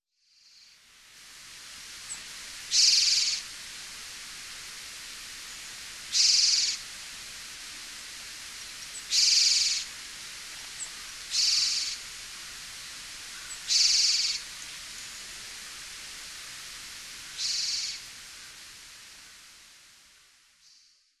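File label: Japanese Tit; sado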